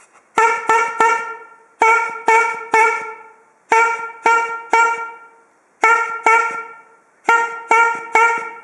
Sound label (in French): son klaxon poire; mdkh 04